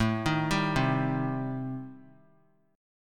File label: A-Minor 7th Flat 5th, chord; arpeggiated; JGuitar